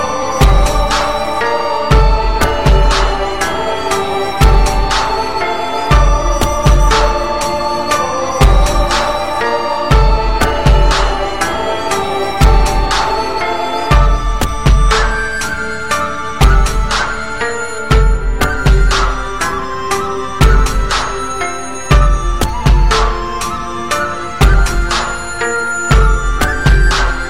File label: Asian flute instr; Electronica